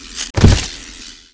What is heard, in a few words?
death, weapons, hero; dota; razor